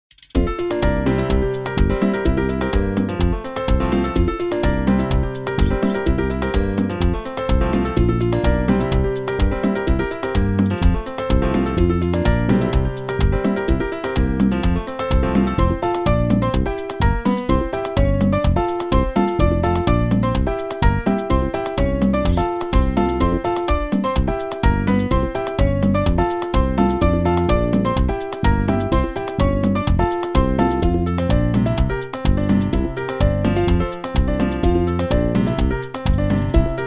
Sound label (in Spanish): pista merengue